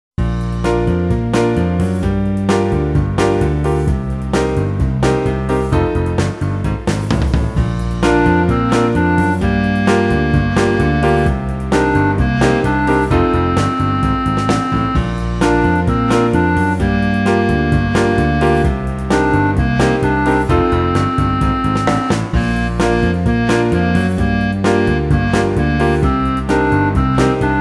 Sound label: pineapple punch fast